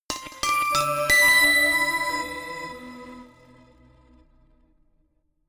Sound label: notification glockenspiel crazy sequence ascend